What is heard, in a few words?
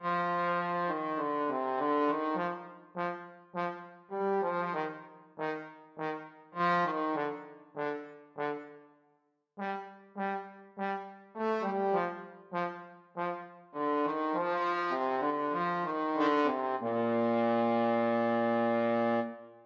trombone